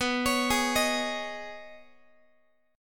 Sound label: B 6th Add 9th B down Guitar 4A 4E 5A 5D 5G sharp 6C sharp; B6add9; arpeggiated